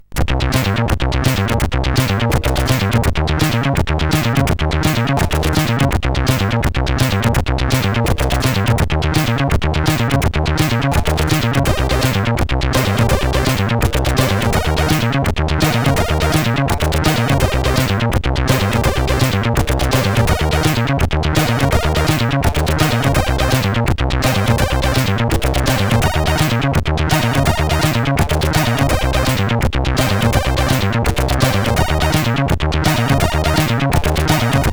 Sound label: Intro Tune, sid CSG8580R5